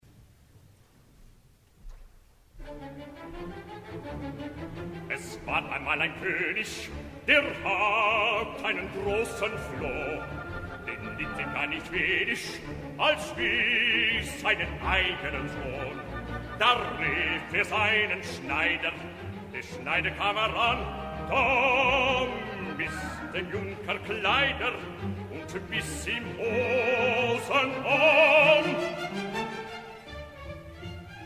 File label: Bass Goethe Lieder; Ferruccio Busoni